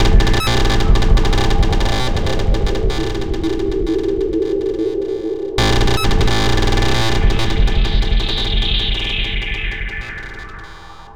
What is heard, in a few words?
Remixed Acoustic Bass